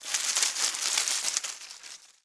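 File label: hit leaves; Jack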